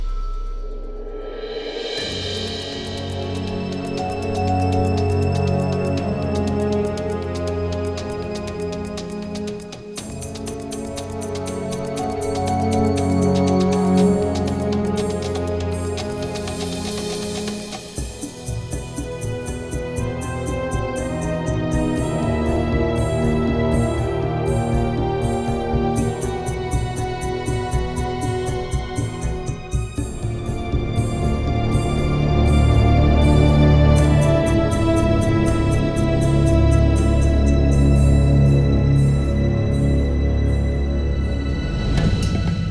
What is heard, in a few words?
tense investigative MUSIC; Castle on the Coast; PS4